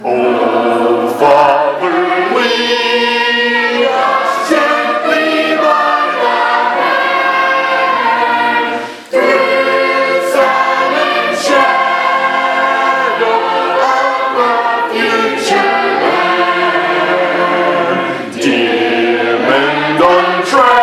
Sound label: singing class, hymns; o father lead us